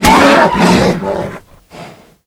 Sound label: horse hit; mob; Minecraft; Votive World